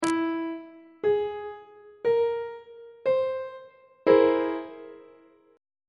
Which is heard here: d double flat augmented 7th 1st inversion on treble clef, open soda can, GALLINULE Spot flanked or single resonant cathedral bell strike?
d double flat augmented 7th 1st inversion on treble clef